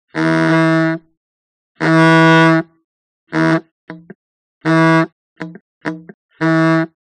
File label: Horn Veteran Car Horn